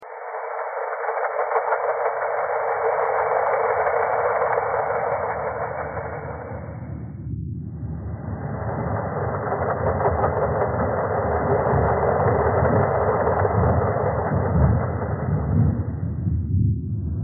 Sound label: thread pentatonic